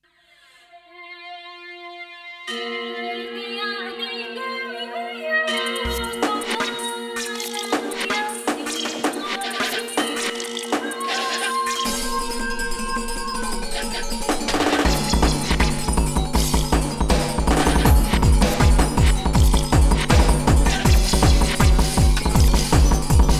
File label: gamelan minimal mix; beatmania gottamix2 going global